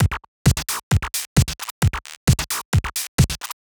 STRIKE DRUM LOOP; Alien Imaging; STRIKE FX

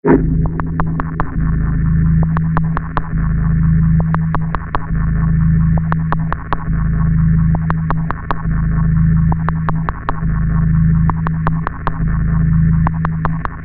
Knocking Drone; Best Of N2O Library; Drones & Foundations

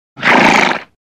Horse Blow Single; Birds And Animals

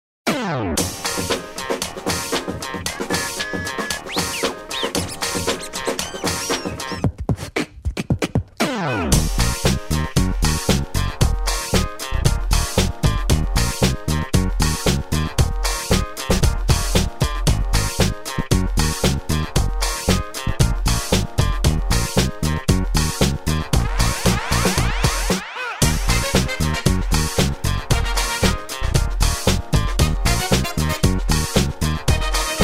DANCE

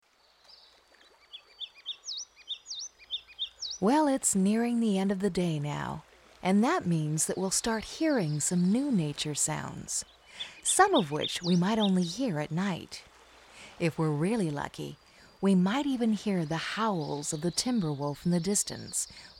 ruby-crowned kinglet

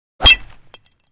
Bullet Impact Sweetener Into Heavy Metal Object; Crashes Impacts

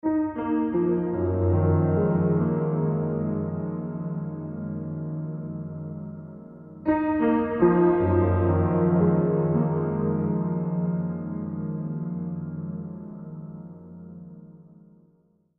Piano FX; Beds

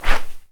SWISH, FIGHT; 1000fx

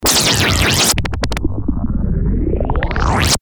Hard Knock

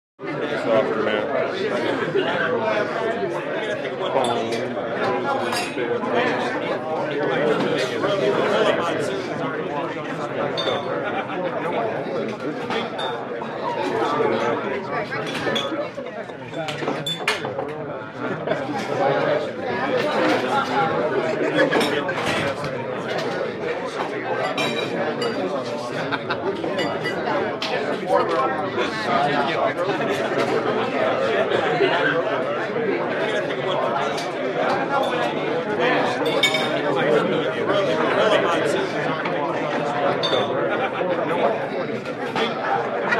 Bar Large People Chatting; Interior Crowds

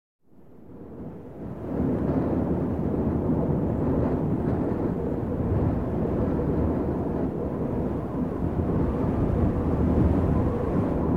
Heavy Gusting Wind Brush

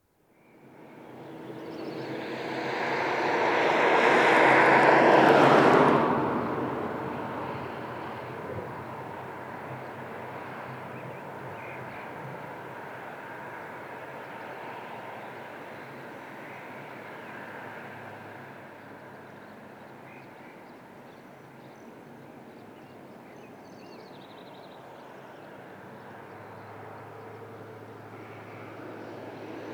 Cars Passing On a Distant Highway; Dr. Sound Effects; 210 Nature Sounds - 20 Hours of Relaxing Natural Ambiences for Meditation and Sleep

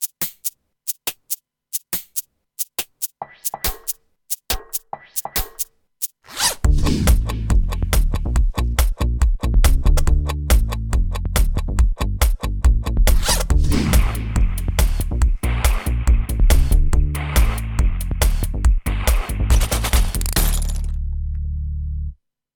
Plastic Bags

